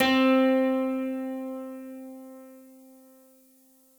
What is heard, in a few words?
Piano Noise C4; Synthetic's Serum Collection